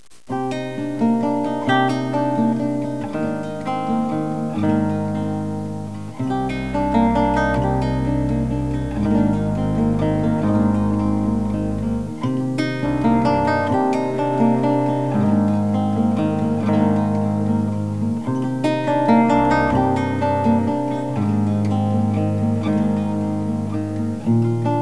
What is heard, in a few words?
NEW AGE